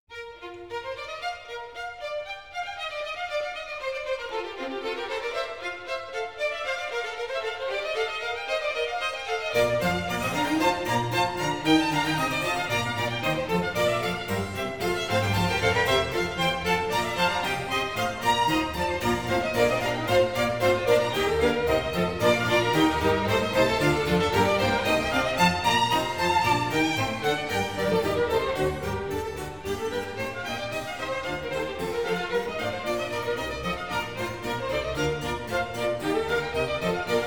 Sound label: Concerto a cinque B flat major Allegro vivace